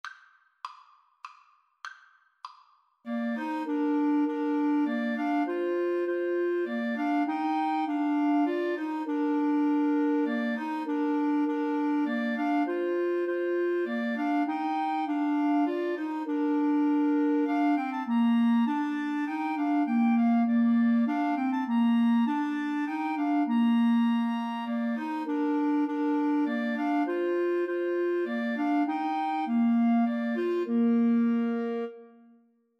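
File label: come thou fount 3 clarinet karaoke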